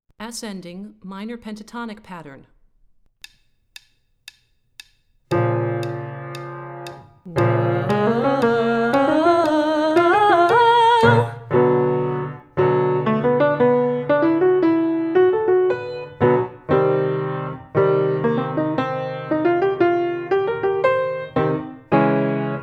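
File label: Ascending Minor Pentatonic Pattern - Low, Vocal Warm-ups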